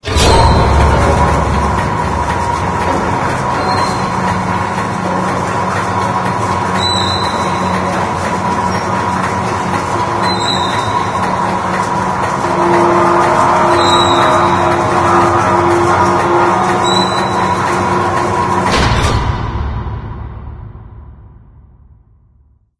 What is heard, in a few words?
transition bridge up